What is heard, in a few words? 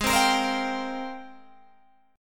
Ab Major 13th, Guitar, Open G